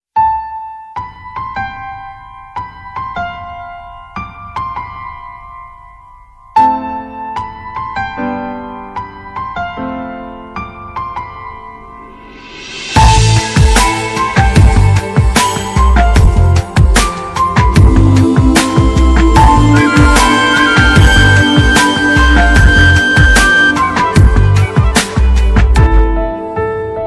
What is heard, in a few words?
ringtone music and background music